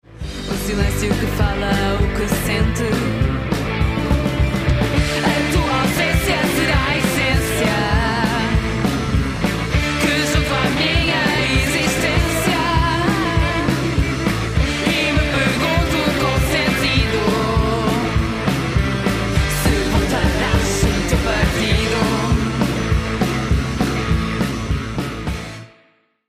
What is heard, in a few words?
blues